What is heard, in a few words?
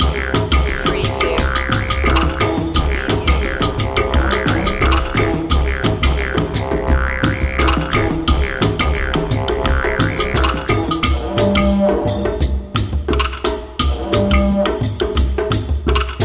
outback groove loop